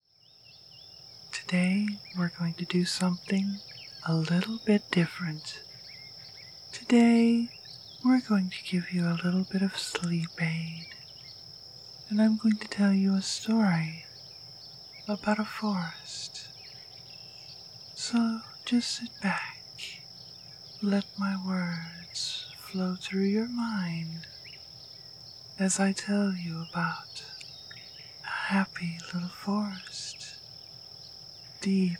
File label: Forest Sleep Aid, ASMR